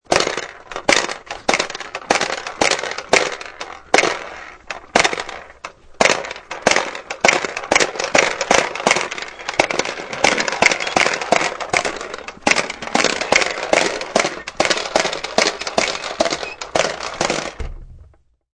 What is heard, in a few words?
Popper Toy, Pop pop popping of todler's popper toy.; Push Popper Toy; Children; Human